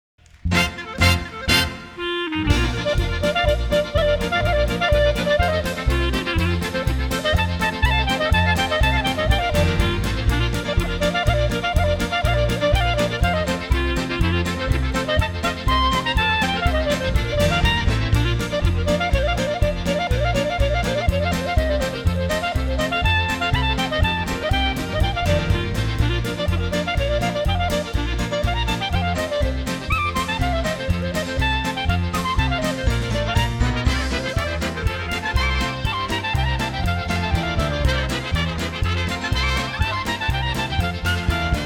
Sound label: Clarinet Polka